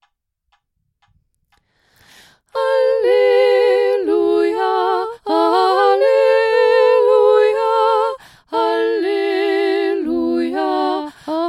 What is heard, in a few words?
O Magnum Mysterium Alleluia Soprano Alto